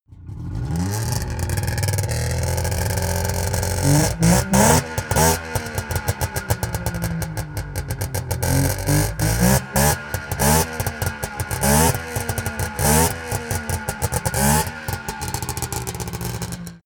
car saab 92 exterior rev

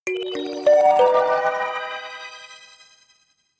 notification kalimba magical sequence minor

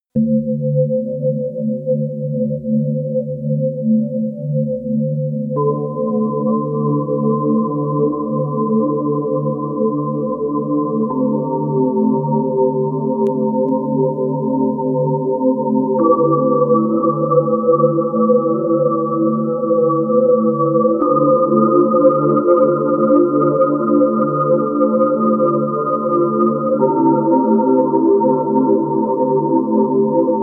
singing glass; flaechen

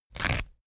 toilet paper sheet tear off; haus-garten

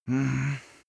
male sigh; menschen; koerpergeraeusche

